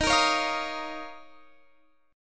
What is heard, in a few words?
Eb 7th Suspended 2nd, Guitar, Open F; strummed